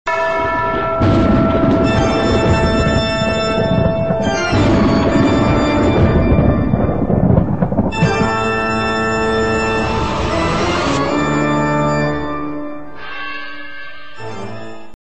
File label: Toccata e Fuga; Dracula; Ringtones